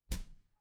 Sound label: impact slam